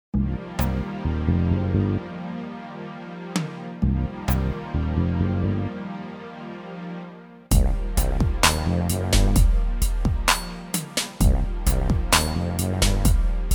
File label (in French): VERSION KARAOKE